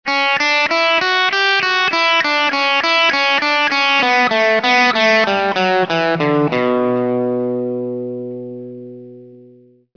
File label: riff slow